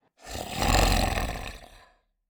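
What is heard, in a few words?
Zombie; Clam; Blind Survivor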